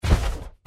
run, power armor; clothing system

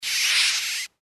giant rat pant

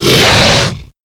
attack

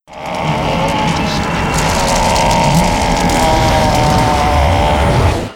charge

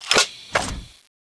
draw empty; tfa cso; petrol boomer

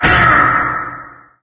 chomp; doom3; forgottenone

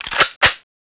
clip in; tfa cso; thanatos3

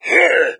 attack, hazmat zombie; doom3

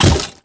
step run

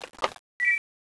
draw, weapons; tfa cso

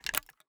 arrow load, bow; bow deerhunter

